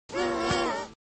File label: Roar; Minilla; Millennium